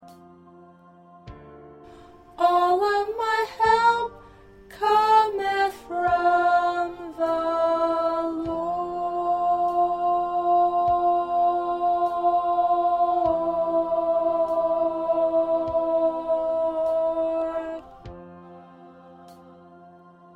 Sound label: 2nd Alto